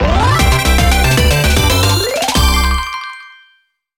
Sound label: SFX Clear